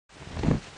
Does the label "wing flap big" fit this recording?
Yes